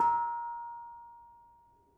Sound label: Bonang Panerus, gamelan